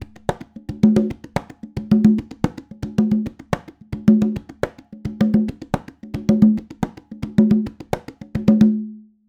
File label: latin conga loop, 110; perc construction loops; SEISMIC Drum Sample Pack; Slate